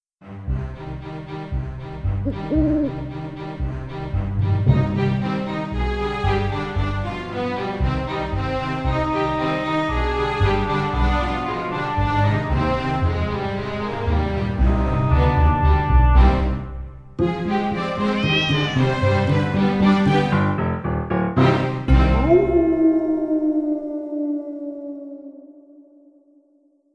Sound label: spooky campfire theme